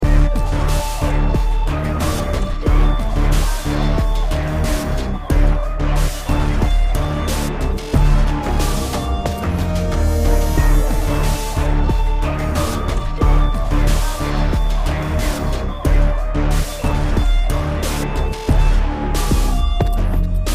Ghost Intensity

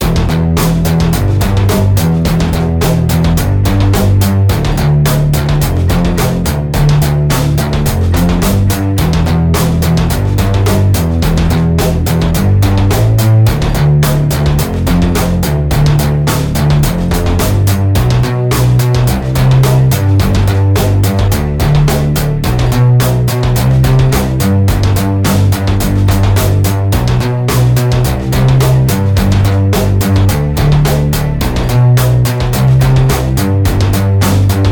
Mech Music; Monster Madness

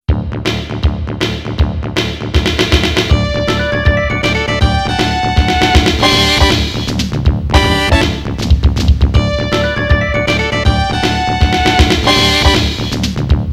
All Clear; Darius Premium Box Rebirth

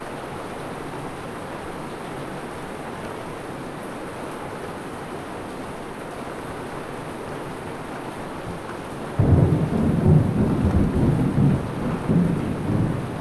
Thunder And Rain loop

